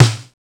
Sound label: Linn 9000 snare; Akai MPC5000